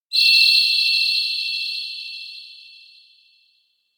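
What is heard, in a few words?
electronic grasswhistle play; yamagata; anemoi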